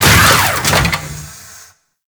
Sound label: Necramech Ranged Hit React; Warframe